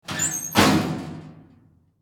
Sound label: Door Locker